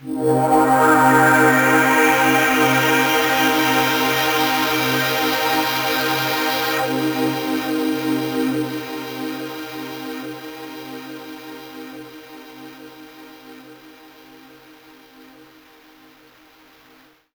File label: pad 4khz cmin9; canz; M8; M8JUNGLEPAK1